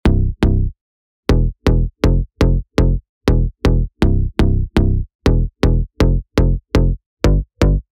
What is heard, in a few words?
thump factory loop